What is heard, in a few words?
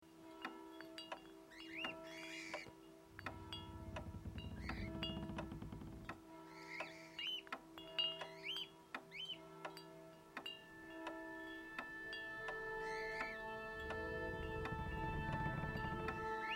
Orchestral Tuning